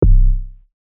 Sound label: log drums